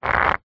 foley stone scrape scratch mark concrete; zapsplat

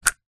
leisure fishing reel bail close; zapsplat

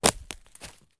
foley footstep twig snap forest floor; fork media

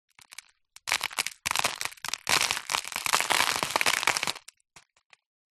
debris crumbs or dust fall onto plastic sheet; zapsplat; foley

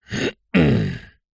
human male cough clear throat

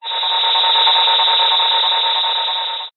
science fiction radio signal interference burst

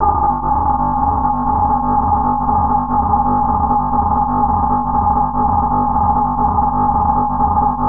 Broken Club Synth; martyn stonehouse

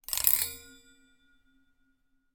impact metal scrape grill small resonate

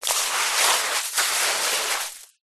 wet sand hand movement

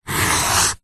office pencil draw line on paper; zapsplat

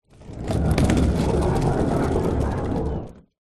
foley suitcase pull wheels wood floor short

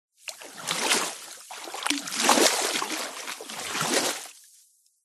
nature water med shallow movement slosh

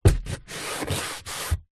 car hand or body part hit rub ceiling roof

